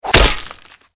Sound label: waraxe flesh